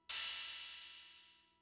Cymbal, Fricke MFB-522, Drum Machines

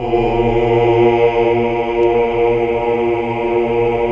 AAH; Best Service Gigapack 1

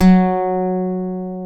PLK F#2 Bouzouki, Greek; NorthStar - Global Instruments VOL-1

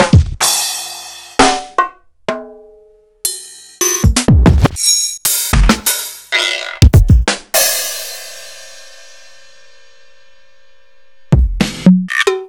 EMU PX-7; m8

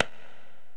Korg x5, Drums

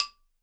BALAFON; Best Service ProSamples vol.52 - World Instruments 2; AKAI

